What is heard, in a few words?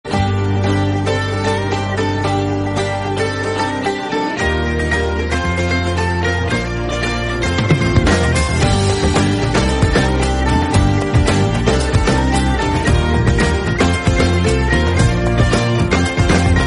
guitar ringtone; bollywood